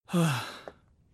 sigh

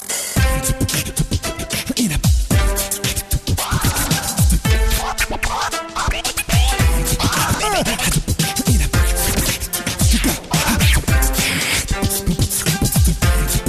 Canon In D

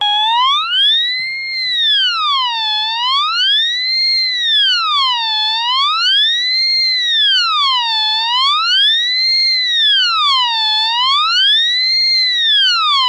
Submarine Collision

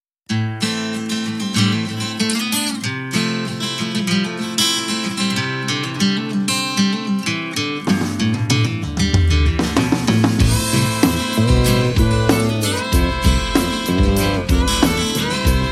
Grand Piano

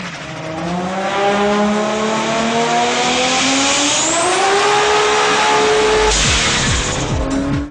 Spooling And Bov Dyn

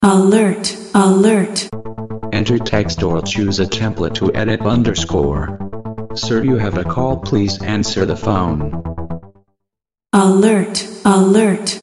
Call Alert

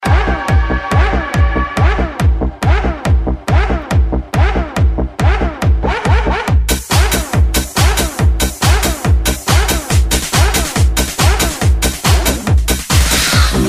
Speed Bike techno

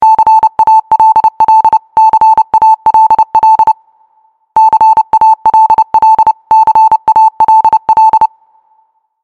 morse code c-a-l-l

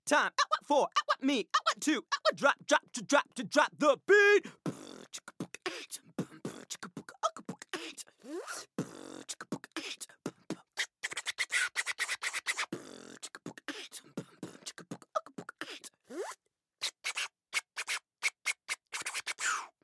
Beatboxing

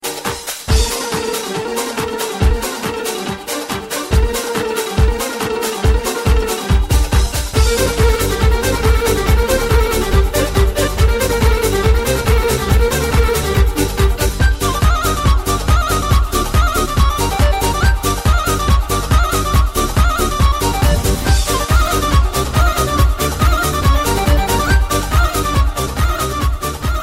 Balkan Folk; Balkan Folk Mix

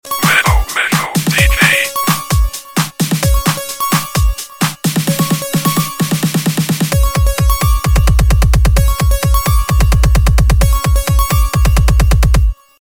New Tone

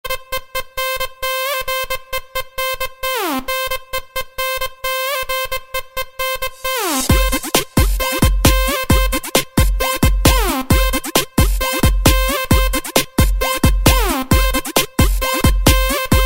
House Music Ringtone

